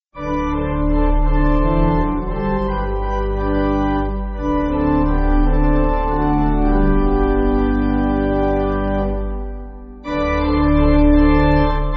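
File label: Pipe, Pipe Organ, Music Backing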